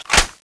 ng7 clip in, weapons

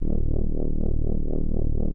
idle, weapons; divine titan

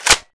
clip in; drakar

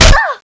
hit flesh

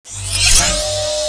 dual sword skill on

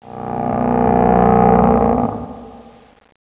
idle, tentaculo; CSO KrakenBoss

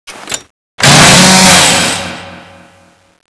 chainsaw draw, weapons

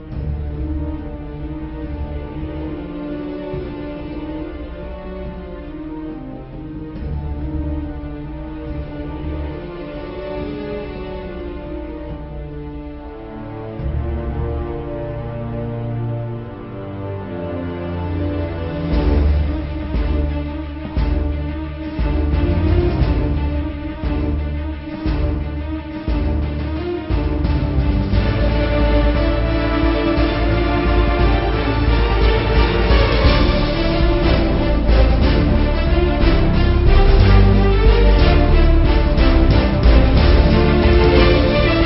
pirate; ze potc fabi

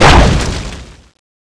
whip sword stab loop end

